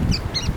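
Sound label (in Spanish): pijui f gris, Synallaxis frontalis - Pijuí frente gris - Sooty-fronted S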